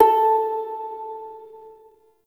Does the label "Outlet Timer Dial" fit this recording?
No